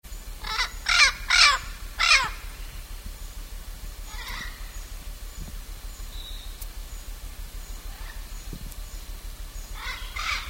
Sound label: blue fronted parrot